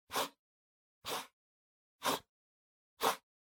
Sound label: PC Mouse Move Wood Short